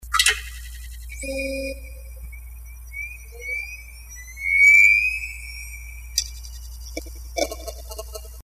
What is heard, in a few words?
startup, hdd; seagate barracuda 400GB noise